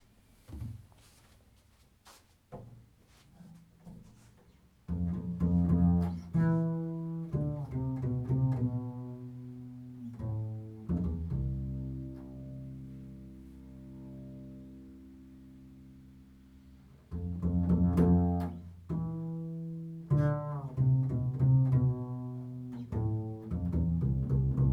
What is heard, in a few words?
Bass Melodi